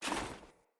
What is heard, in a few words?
Foley Action Armour Run; Player; Deora Armour